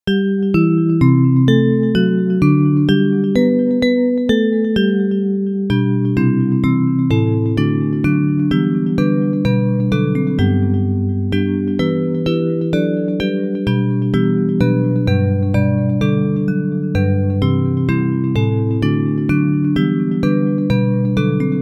Immortal Invisible God Only Wise Bells; Hymns; Praise

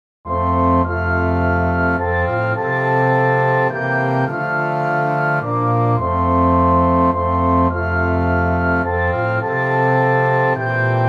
Amazing Grace Orchestra; Hymns; Praise

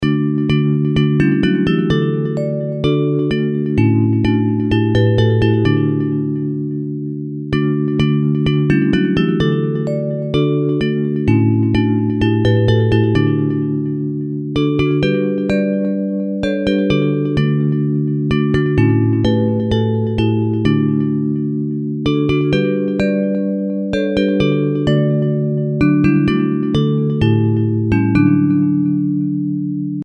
Savior Like a Shepherd Lead Us Bells; Hymns; Praise

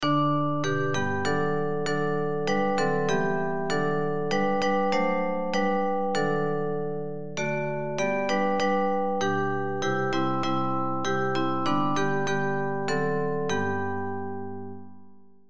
O For a Thousand Tongues to Sing Bells; Hymns; Praise

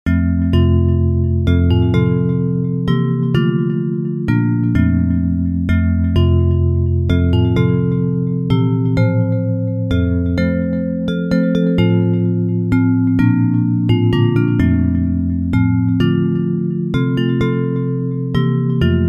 Amazing Grace Bells; Hymns; Praise

